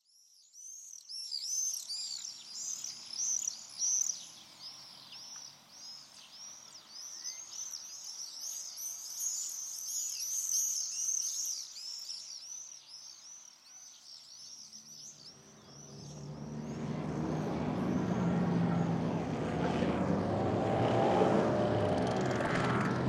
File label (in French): bains des paquis; la spatialite du son geneve enquete dharmonie sonore